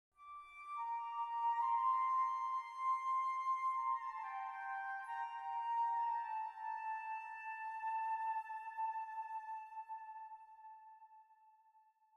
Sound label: Quite noble festivities